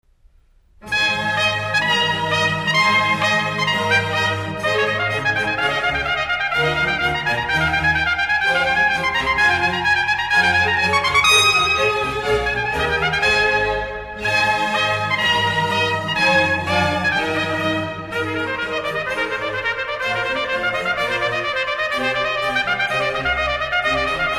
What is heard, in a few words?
Concerto for Trumpet in Ab; Vivaldi; Trumpet Concerti